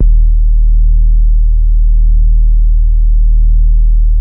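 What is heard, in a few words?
SUPPORT BASE, BASSES; Masterbits; Vintage Voltage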